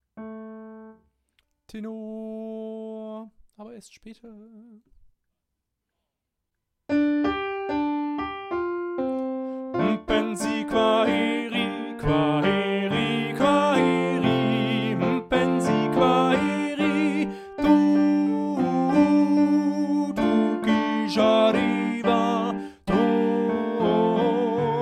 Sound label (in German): Kwaheri Tenor mit Tutti Klavier